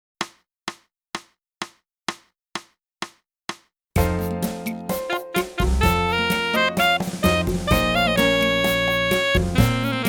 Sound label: sax